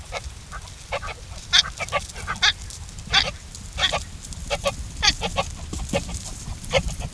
Procapra gutturosa male chasing female

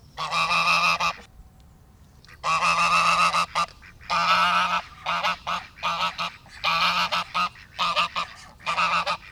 Anser domestica male

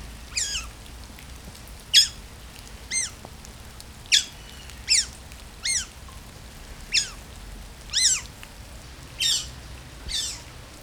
Aonyx cinerea

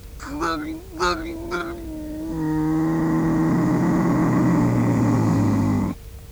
Felis lynx articulation

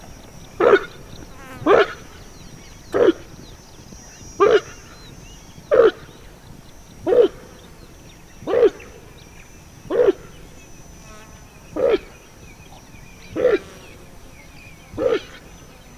Connochaetes gnou female alarm

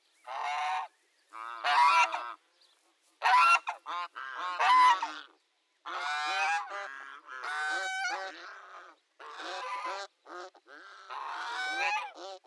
Anser domestica group

